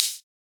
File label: noise hat open